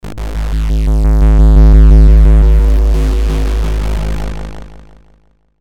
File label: Digital Synth Bass Distortion Delay Swell; glitches; Blastwave